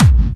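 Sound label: BD Clubby, bass drum; VEC1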